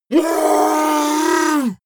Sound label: yeti attack shout aggressive painful; schreie